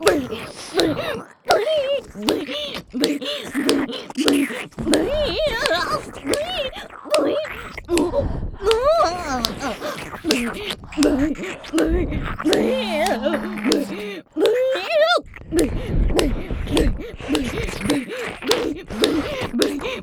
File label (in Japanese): ブヒ声喘ぎ＋ねっとり耳舐め（パンパンSE付）